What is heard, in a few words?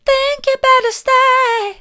think you better stay soft, vocal